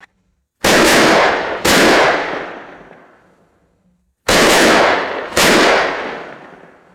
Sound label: four consecutive outdoor rifle shots; guns